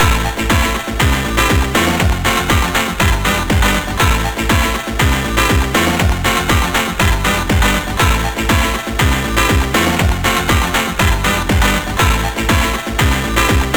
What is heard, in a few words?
digi, MOS6581R2; London Demo